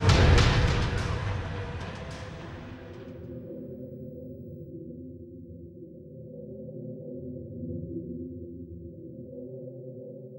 Docks

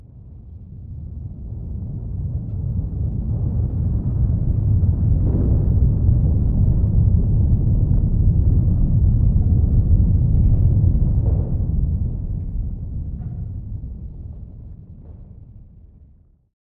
Earthquake Loop; weather fx; Silent Hill Downpour

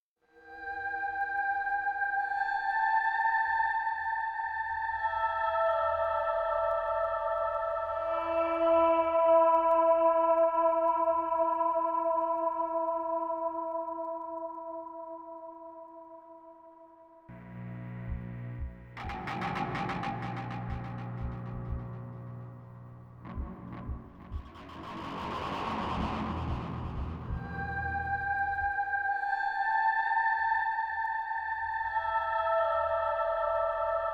MUSIC TOWNSAN; sh origins

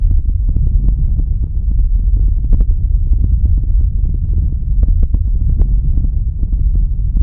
Sub Rumble Loop; horror and atmosphere fx; Silent Hill Downpour

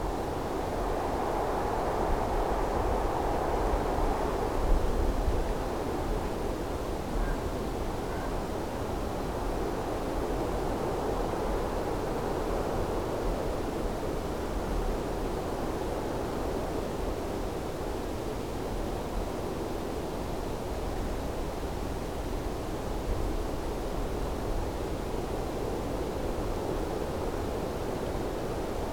Diner Amb Cliff Edge Wind Loop; weather fx; Silent Hill Downpour

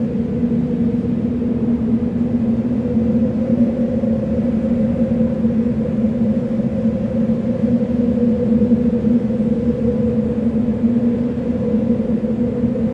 Tree Cross Loop; Diner; Silent Hill Downpour

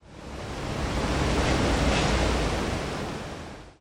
Wind; Silent Hill Downpour

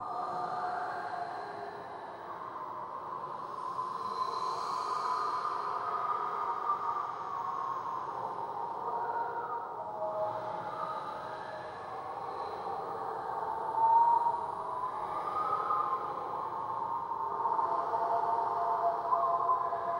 Whistle Draft Loop; weather fx; Silent Hill Downpour

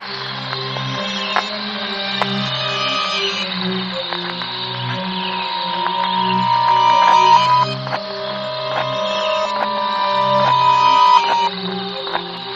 Gramophone Scary Backwards; Silent Hill Downpour

